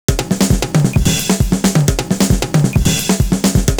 crash inside, 133.5

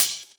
Open Hhat, Hihat; Equipped Music; Loungin House

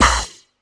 hit, Drum & Bass 160 BPM; Ueberschall; Drum & Bass Flash Trackz

